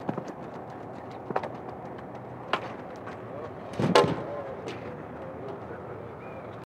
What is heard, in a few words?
Pole Vault Movement